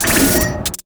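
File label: war damage, Mob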